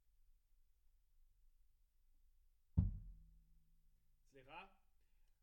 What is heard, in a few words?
Room A